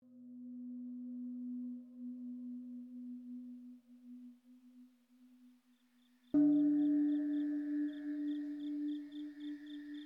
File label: forest at night; great meditation guru; harmony balance